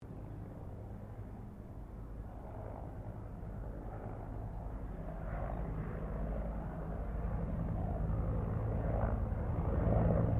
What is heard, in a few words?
HELICOPTER BELL UH1 IROQUIOS PASS LONG; RAN UH-1 Iroguios; Helicopters (Sound Effects:Vehicles)